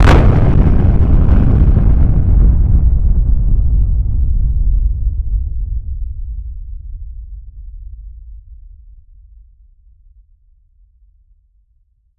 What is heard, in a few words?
lo-fi muffled underground, battlefield